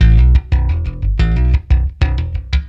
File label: Electric Bass; Sonic Foundry (Sony Creative Software); Hydroponic Hip-Hop